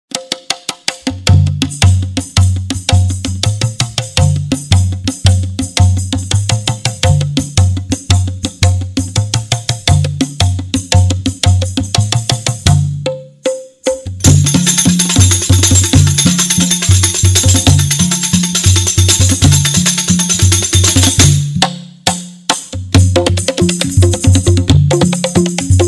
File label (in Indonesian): INSTRUMEN, terbangan; Bandung; Sumedang